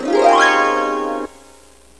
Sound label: HARP GLA; MUSIC; INSTRMNT